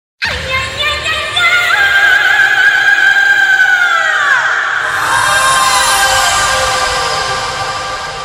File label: Secret Scream Sms